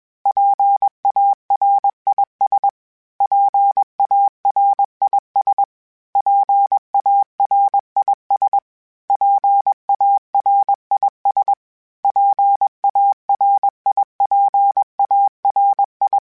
PARIS, Morse Code; HamRadio

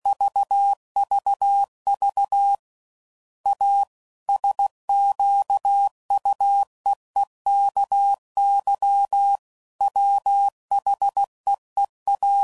Squeaky, CW Code Groups 5WPM; Morse Code; Sayings; Chuckles

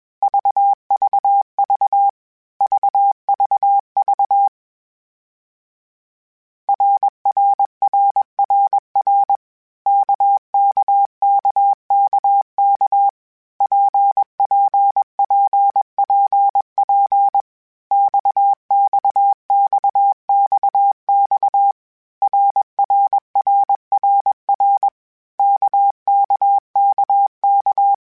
RKPX, Morse Code; CW Code Groups; Basic Letter Groups; HamRadio; Basic Sets

